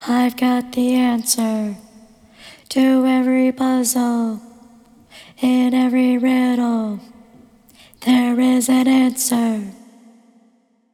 vocal seed

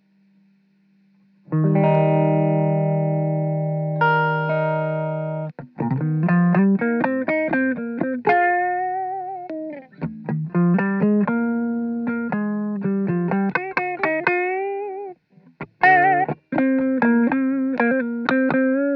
Guitar Impro

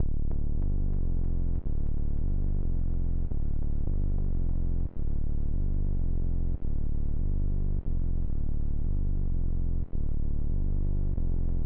Deep Sub Bass

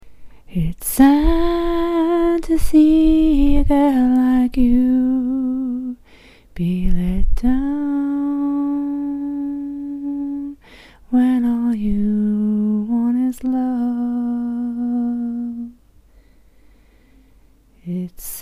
Sad to See (Chorus)

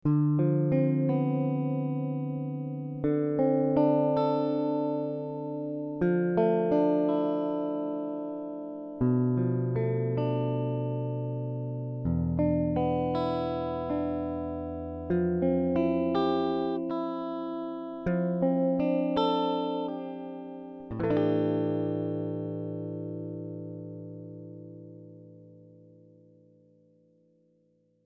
Dm 80 GTR Figure Of Eight

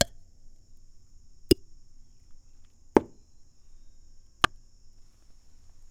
perc

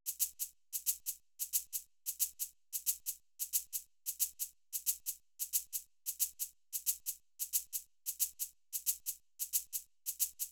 drums shakers

